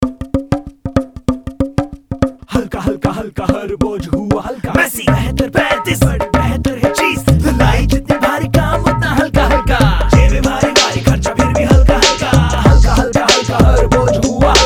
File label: Ringtone